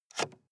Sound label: sports car int ventilation adjust soft; verkehr transport; autos